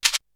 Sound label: Shake, Drums; ZERO G - Datafile DISC 3